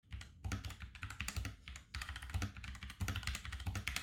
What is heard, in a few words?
Orange Switch